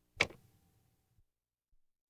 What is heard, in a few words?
Household Phones